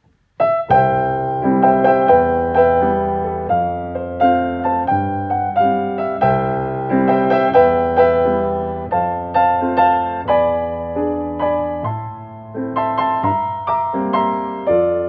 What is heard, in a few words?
Piano medley Op 114